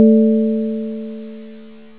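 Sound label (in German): glocke, kirche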